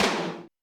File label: Tom Md Thrash; Alesis D4